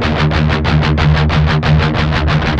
Guitars; Spectrasonics; Hans Zimmer Guitars VOL-1; MED 16TH

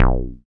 Bass SH-101

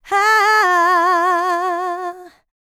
LICKS, Vocal, R&B; ILIO; Dance; LT R+B

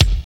KIK DANCE, Dance Kicks; Roland L-CDX-01

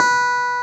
CLAV B4; Sonic Reality; Vintage Timetraveler; CLAV PIANET

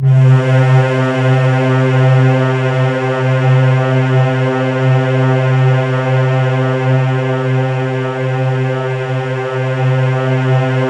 C3, Synth Leads

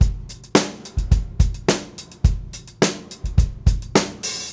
Beat Loops; Zero-G; Monster Beats

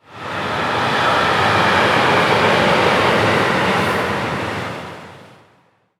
Misc Short FX; Vengeance - Essential Clubsounds Vol.4; Effects

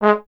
Trombone G#; Miroslav Vitous - Solo Instruments VOL-1

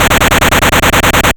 Gun; Graz

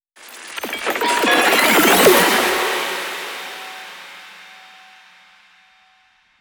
Dritiest snare ever; M8

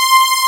SWEEP; Syntec; Wall of Sounds

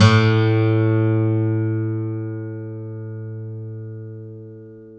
STEEL F A1, GUITARS; Synclavier Sampler Library

